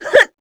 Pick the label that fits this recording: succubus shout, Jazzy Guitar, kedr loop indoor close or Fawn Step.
succubus shout